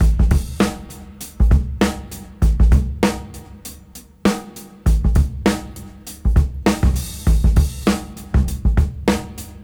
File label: Swing 99 BPM; AMG - Keith LeBlanc Phat Butt Jams